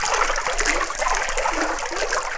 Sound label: Swim; XBOX; Finding Nemo